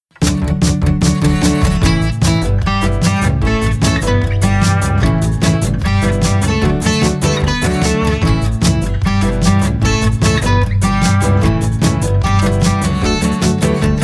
Chasing Butterflies Instrumental

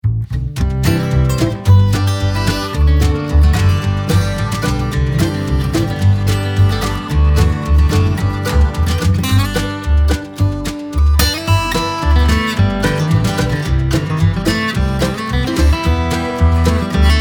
Blue Bluegrass Instrumental

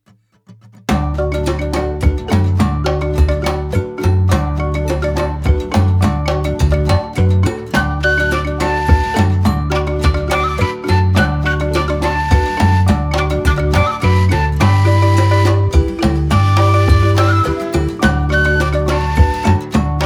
African Rainfall

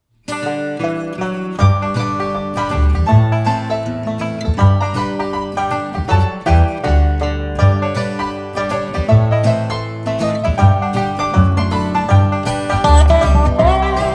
Honeycomb Harmony

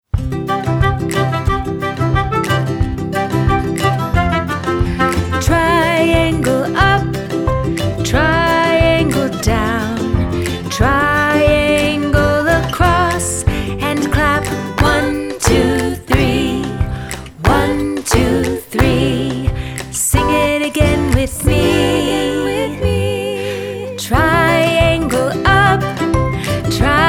Triangle

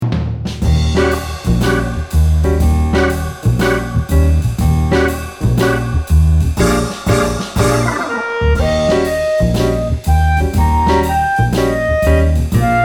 The Wheels on the Bus Instrumental

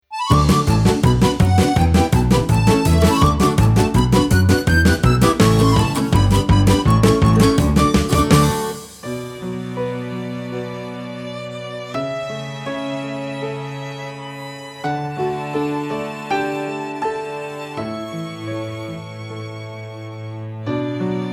surprise speed instrumental

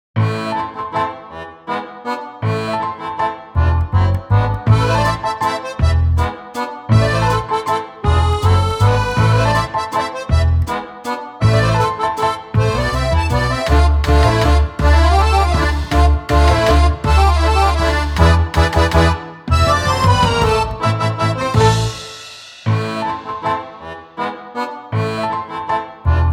Polka Instrumental

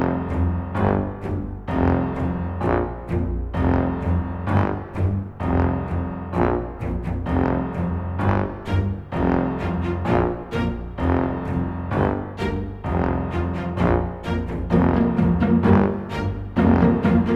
Instrumental

